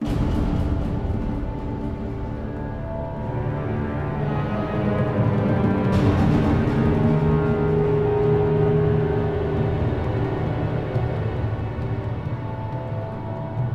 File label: intro countdown; heroes of order chaos; android ios mobile gamerip 2012